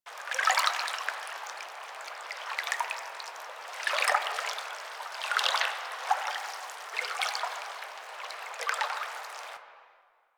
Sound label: Waterlap Loop A; ambience; props; Eidolon; Warframe; Eidolon Plains